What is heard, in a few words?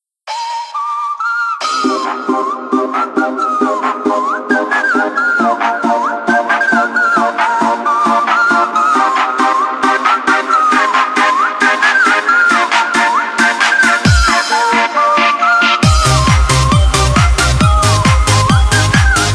music, jailbreak; niftymaps